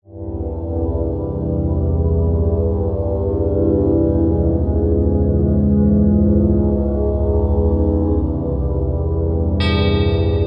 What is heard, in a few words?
Ambience